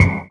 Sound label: Spitter Kick, Esoterik Beatz; Spitter Kit